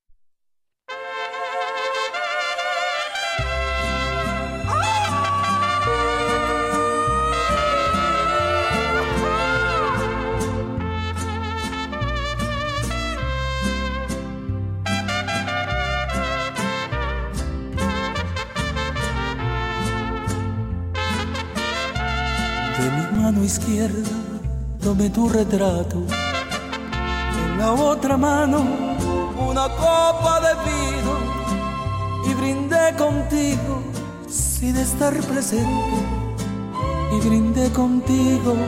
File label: Mariachi